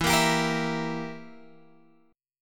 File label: E Diminished E down Guitar Open G